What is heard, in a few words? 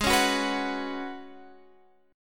F sharp Suspended 4th G sharp; strummed